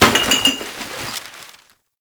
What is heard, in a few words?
Garbage Bag Destroy; Warframe; Ambience; NineteenNinetyNine